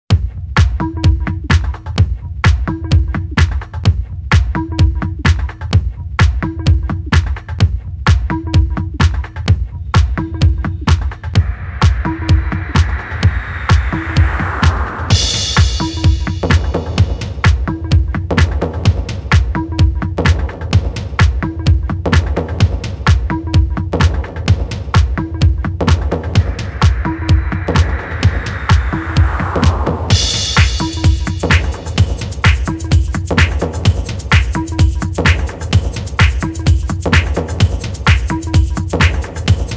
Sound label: Pink Noise Vocal Mix